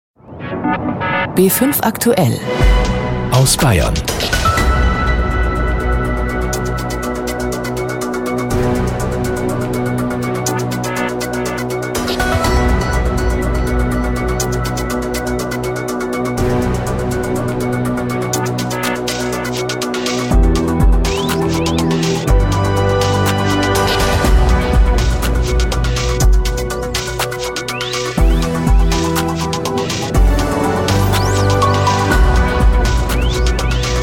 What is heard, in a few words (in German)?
Image Promo Container Opener und Musikbett